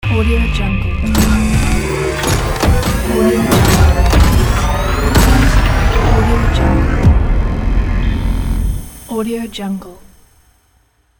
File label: transforming logo